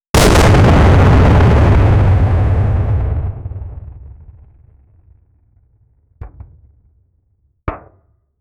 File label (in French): gros canon de bateau de, cannon